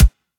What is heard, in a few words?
Acoustic Synth Kick